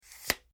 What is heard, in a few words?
Tri-Pod Leg Extension Foley; Scrapes; Objects